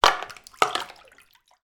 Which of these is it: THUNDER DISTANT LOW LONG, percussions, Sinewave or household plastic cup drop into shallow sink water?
household plastic cup drop into shallow sink water